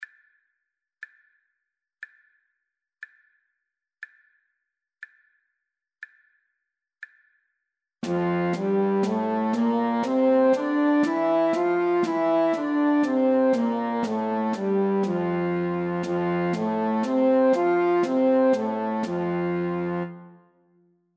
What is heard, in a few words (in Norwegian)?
F-dur natura sakte